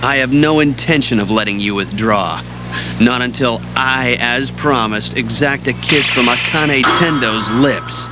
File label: kiss